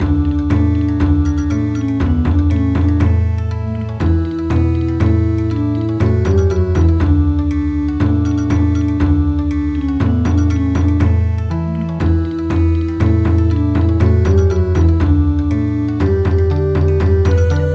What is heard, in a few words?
Bon Odori; Anak kambing saya